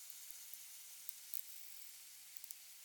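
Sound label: pacific white-sided dolphin clicks; salish sea; not yet heard locally but present; old humans cant hear these well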